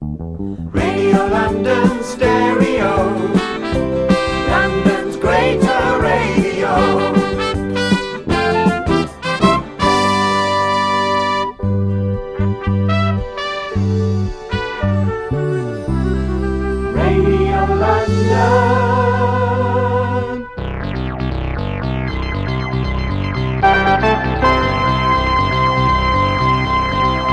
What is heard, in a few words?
early 80s jingles